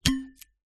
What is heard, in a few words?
household flask metal lid off